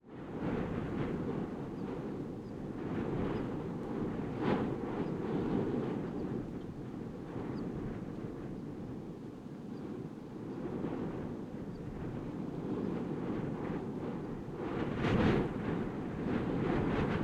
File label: Wind, Gusty Behind Rock Bird, Dovrefjell; Robin Barstow